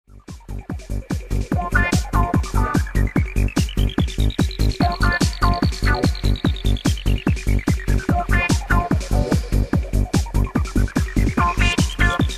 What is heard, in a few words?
Funky